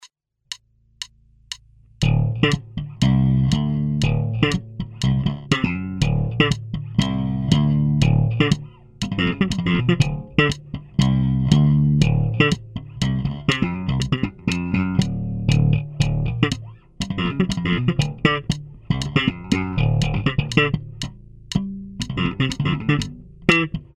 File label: Slap